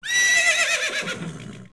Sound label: Epona Neigh; Epona neighing (2 pitches)